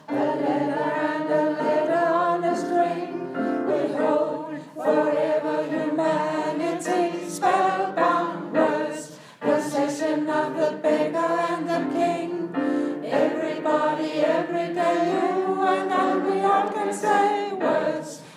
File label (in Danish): vers sopran og tenor; 1. vers